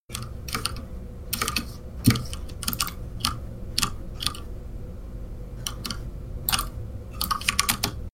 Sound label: keyboard